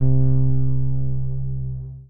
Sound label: string